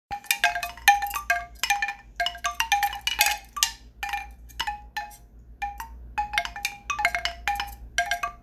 bamboo owl

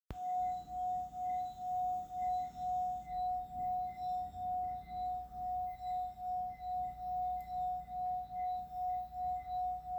Gold HH F Heart

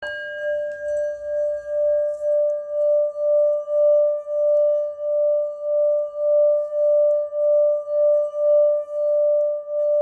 Hammered Mantra D; singing bowl; Flower of Life Purple Rounded Meditation Singing Bowl – 8″D

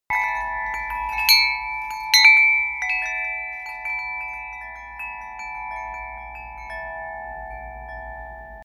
blue tuned

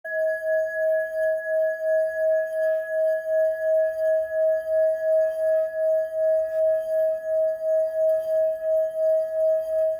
D; Wheel of Life

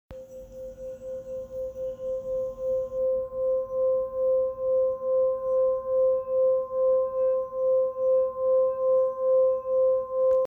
gold hammered C

